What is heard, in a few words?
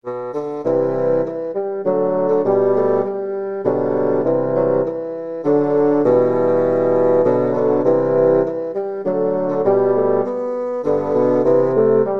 holst jupiter, bassoon quartet